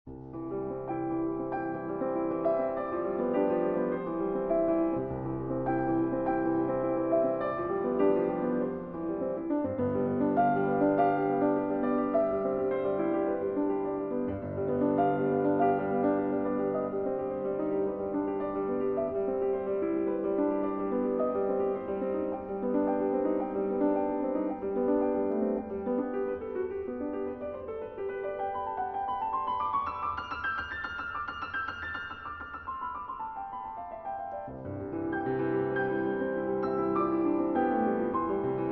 Piano Sonata No. 21 in C Major, Op. 53 III. Rondo - Allegretto moderato; Waldstein; Prestissimo